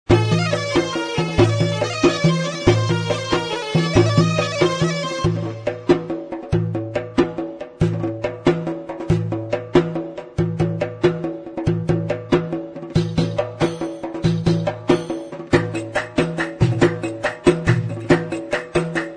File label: bouhilla, arabic; pa80; korg